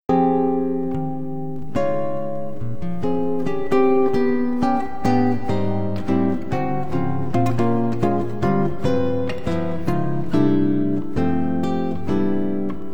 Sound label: Solo Guitar, Fm; Advanced; Jazz